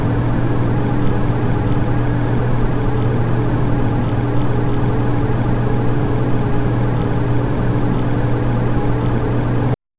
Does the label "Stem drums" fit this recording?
No